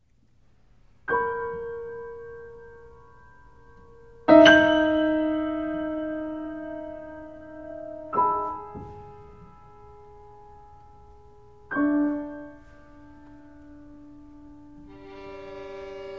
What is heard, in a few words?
Trio for violin, cello & piano Introduction